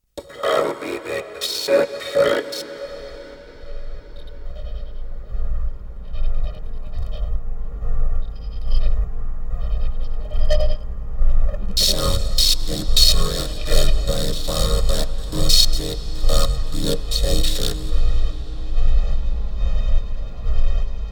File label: medical soundscapes; calmedic sections